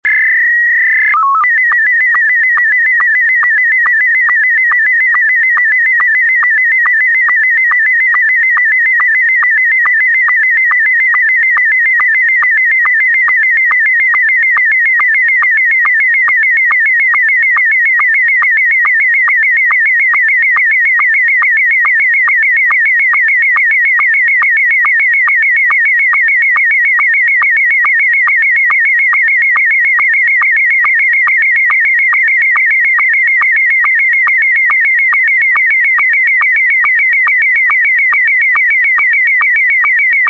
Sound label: scottie s1, sstv